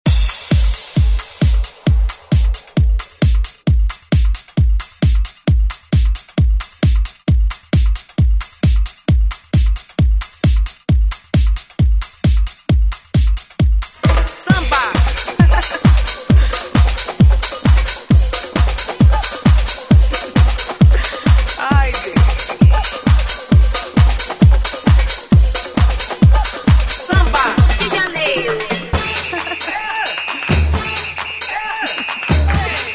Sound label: Samba ReDrum; DJ Mhark